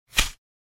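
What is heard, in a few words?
vystrel iz luka, bow shot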